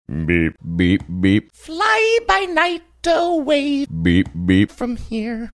singing beep beep beep fly by night away beep beep from here